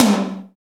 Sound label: high tom one shot g key; tom drum samples